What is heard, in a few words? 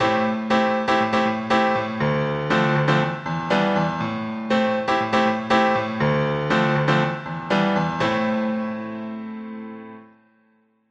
minor song